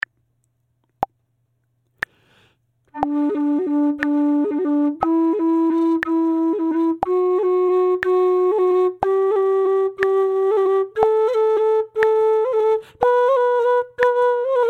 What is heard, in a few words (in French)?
alternance roll regulier syncope, irish flute